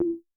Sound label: Perc Reso, Vermona Mono15 Drums